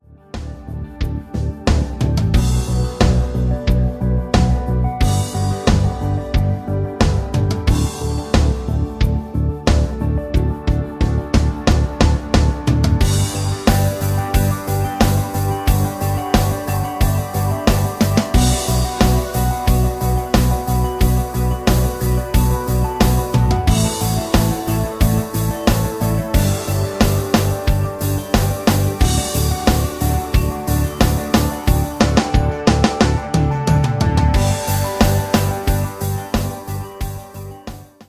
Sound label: rhythm